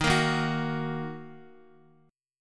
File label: Eb Minor Eb Guitar 4E 4A 5D 5G 5C 6F; Ebm strummed; JGuitar